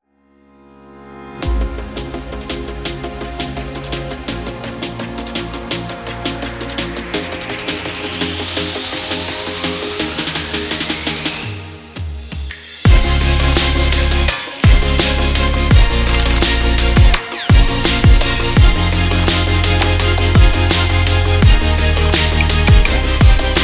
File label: Urban Future Bass